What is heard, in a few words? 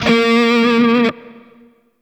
Grineer Shawzin Flourish Oct Two A Sharp; Warframe